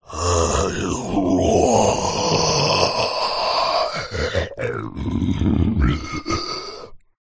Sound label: horror zombie male groan